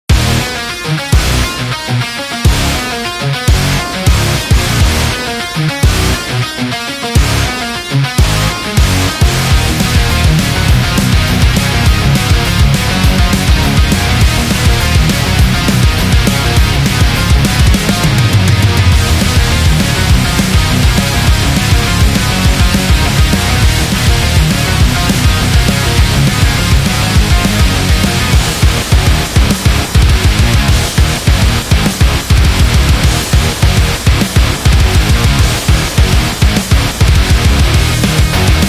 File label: Heavy Metal Guitar